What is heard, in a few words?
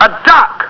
duck